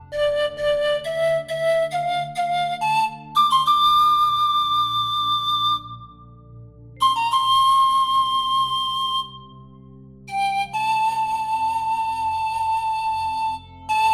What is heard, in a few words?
nai romanian pan flute